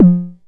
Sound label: percussion; Vengeance Samples